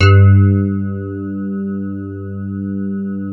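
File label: digi rhodes, left; Sound Gallery - Charged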